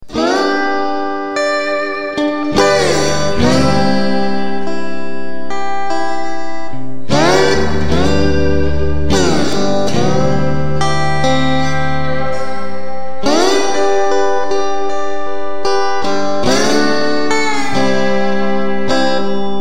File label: Slide, blues